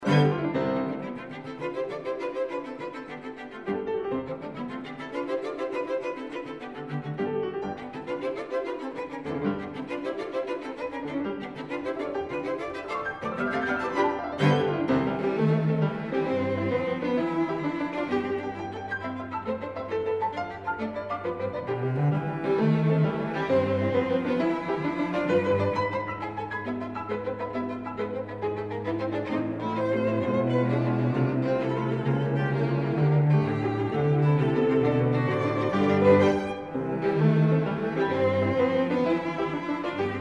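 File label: piano quartet; boellmann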